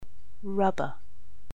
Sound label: rubber